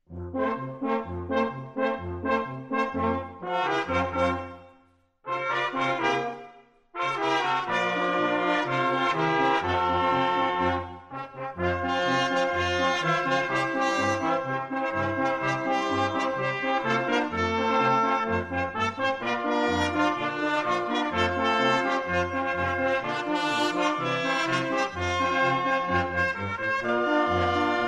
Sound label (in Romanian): Fanfara, colinde